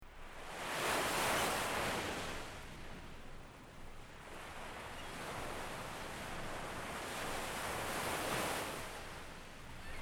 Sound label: Theme Song; Tiki Gardens